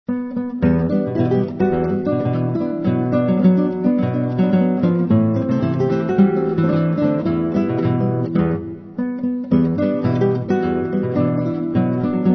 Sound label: Ramba Samba